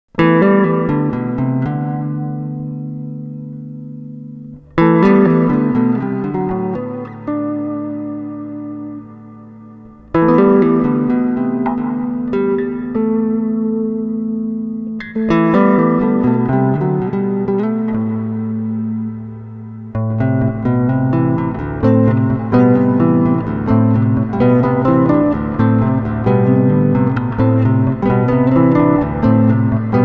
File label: MarkBass Reverbero Solo